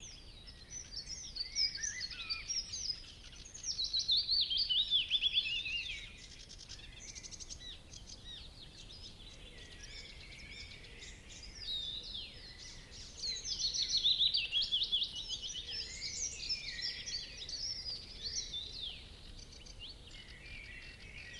forest summer morning active birds woodpecker owl willow warbler; ambix; sonniss gdc